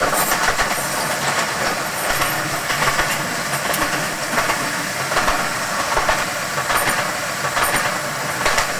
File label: Run, Train; OpenBVE; Cl313 Silverlink